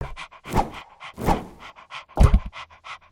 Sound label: Pet Doberman Panting; creatures pets